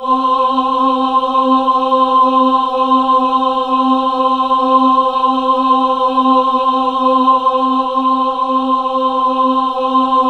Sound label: C4, Synth Leads